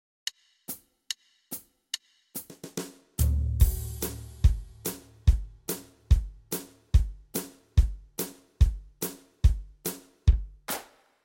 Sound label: beat